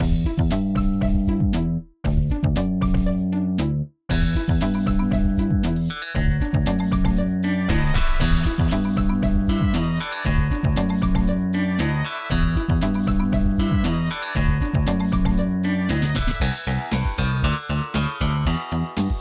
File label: Surfing